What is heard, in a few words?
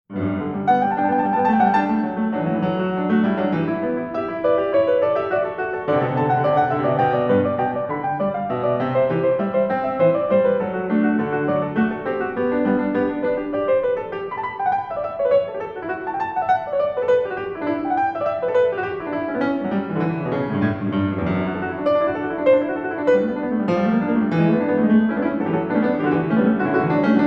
Goldberg Variations Variation; BWV 988; XV. Variation